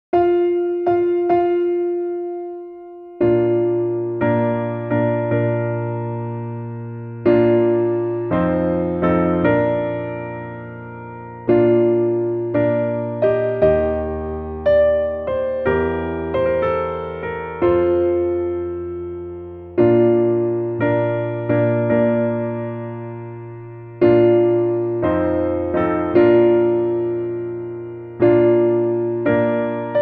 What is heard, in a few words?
Wedding March Wagner Piano